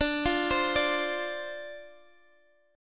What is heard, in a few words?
D-Minor 7th D, chord